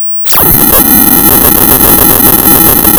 Sound of tearing paper, center

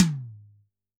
Tom, drum

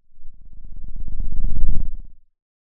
pop

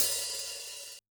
Op Hat, drum

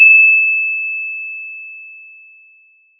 pop, Sound of a church bell

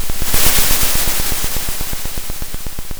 pop, Sound of a drum roll

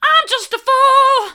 hard, vocal

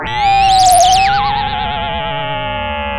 Sound of a police car siren

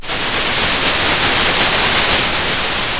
Sound of a thunderstorm

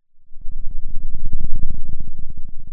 pop, Sound of a thunderstorm

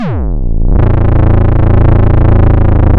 pop, chainsaw

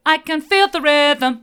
i can feel the rhythm hard, vocal

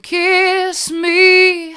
kiss me hard, vocal

